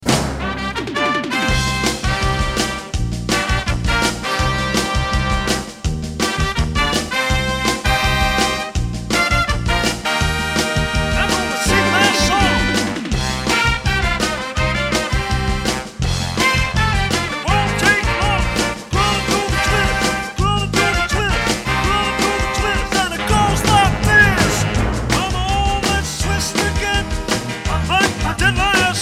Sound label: rock n roll